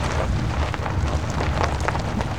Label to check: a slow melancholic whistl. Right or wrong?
Wrong